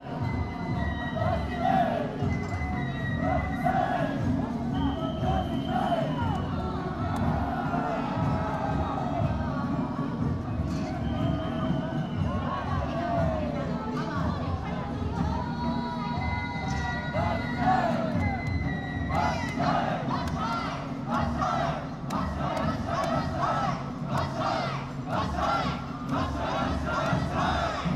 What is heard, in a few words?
parade; machinaka; waraji